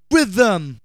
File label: VOX SHORTS; Megabass Remix! 2